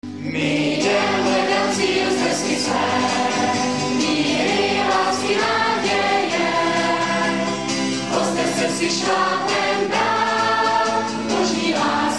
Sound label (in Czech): hymna